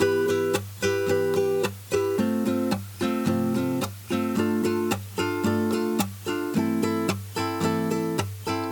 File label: 2-4 guitar nylon